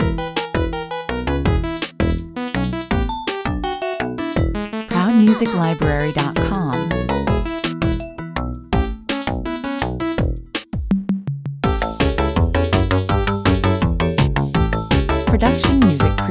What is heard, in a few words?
arcade level, 165 bpm